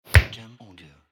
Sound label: Punch